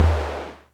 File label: Percussion Computer Tom; Atlantex MPC-1; Atlantex Music Percussion Computer